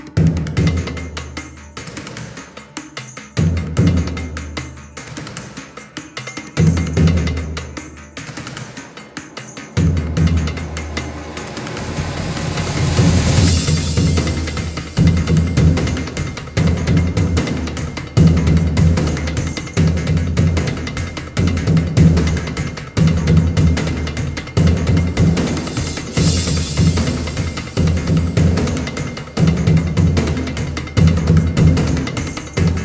Temple Battle; unearthed trail of ibn battuta episode 1

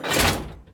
Lever sound

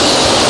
Sfx Winter games

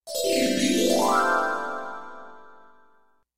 Out Letters Open

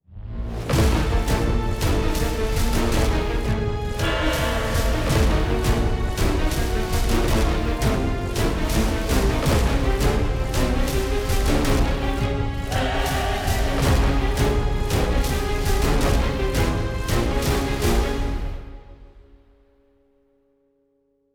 Music Phase 2 Part 2; Azrael

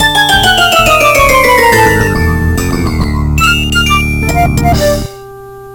Level Fail; Shrek the Third

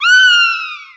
Haunted House Scream; OpenRCT2

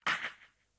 Damage

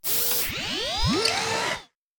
spider boss startup; enemies; unsighted

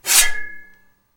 Sword, schwing, fast, thin

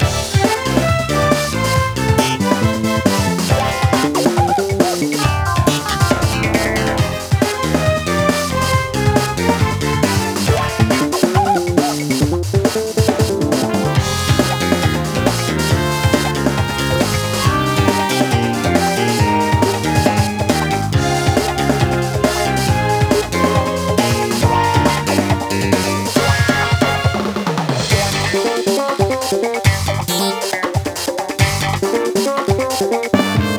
beach LOOP; Deathwish Enforcers

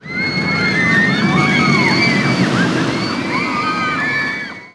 Scream

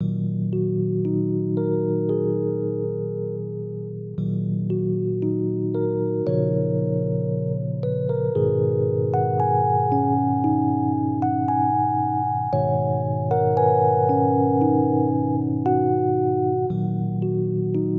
Keys, 115bpm

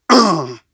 Battle Defeat End; Zote

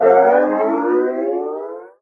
Sfx Baseball

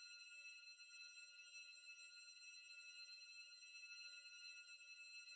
Dream Ghost Idle Loop

